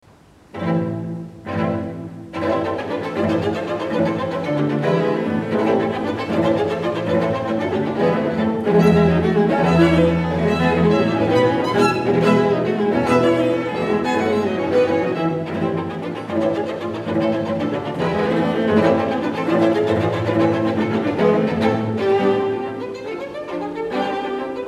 String Quartet in A Minor Op.41 No.1 II. Scherzo. Presto Intermezzo; Schumann